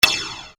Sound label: Percussion, EuroDance